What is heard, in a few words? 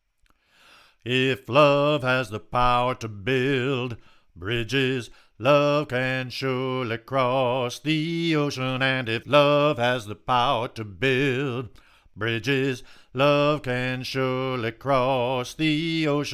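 soprano; The Freedom Choir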